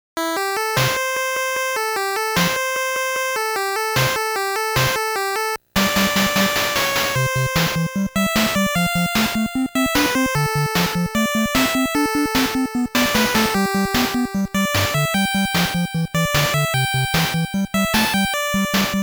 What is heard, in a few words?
Piano Concerto #1 in B-Flat Minor; Chaikovsky's; Mvmnt. 1 (Beginning); Warp